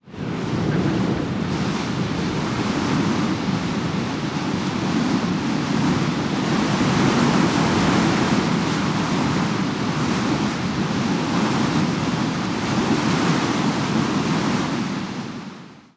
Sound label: ambient; World of Tanks